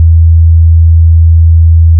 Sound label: sine 82Hz